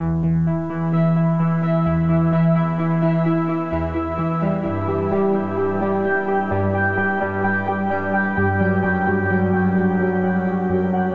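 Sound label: Lonesome Arps, E minor 86 bpm; MIDI Clips; Tonal; Motifs; Ableton; Factory Packs; Mood Reel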